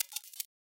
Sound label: graphics glitchy motif; soundscrate